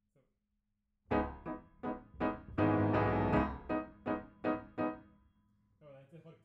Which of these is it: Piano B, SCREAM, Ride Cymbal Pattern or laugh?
Piano B